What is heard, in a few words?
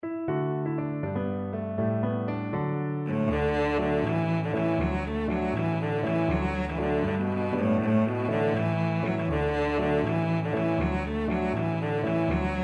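violoncello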